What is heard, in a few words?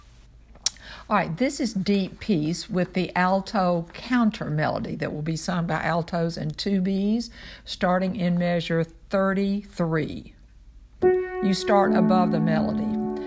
Alto